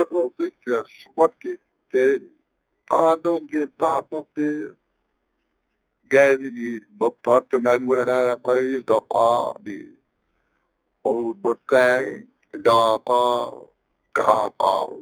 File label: dash for back